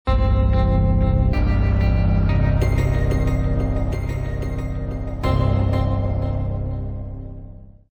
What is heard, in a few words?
beta music